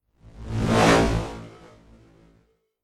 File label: Whoosh SciFi Saber Slow Motion; Whoosh Saber Slash Slow Motion Scifi Science Fiction; Light Sabers